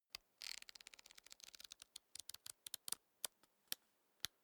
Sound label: small book riffle through slow